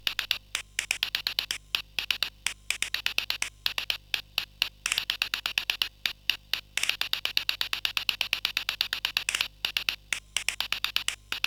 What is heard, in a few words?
Tap, sid, CSG8580R5